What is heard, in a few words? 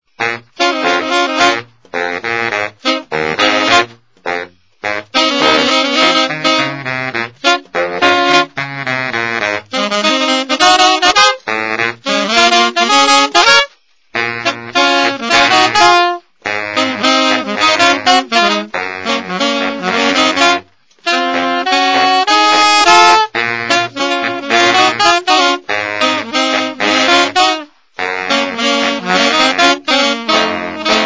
ensemble, baritone; gold medal ii; lacquer